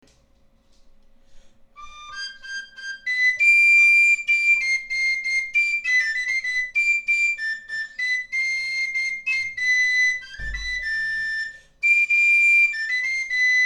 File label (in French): rossignol sauvage la, Galoubet La